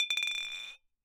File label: Bottle Struck